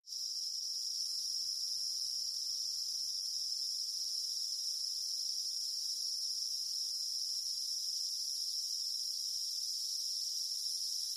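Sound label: Cicadas From Louisiana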